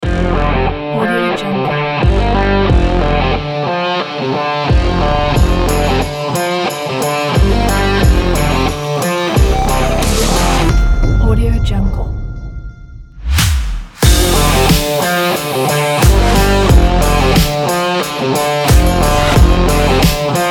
guitar beat for sports

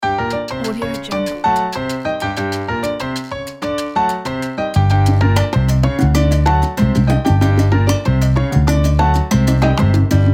crazy salsa tumbao

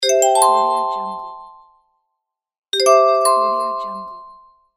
new incoming message

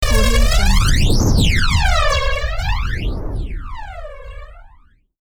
chattering beacon

